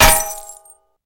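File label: precise hit on a metal target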